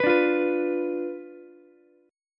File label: B Diminished B down Ukulele; Bdim strummed